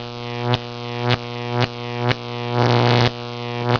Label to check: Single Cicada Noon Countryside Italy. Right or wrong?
Wrong